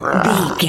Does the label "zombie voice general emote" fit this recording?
Yes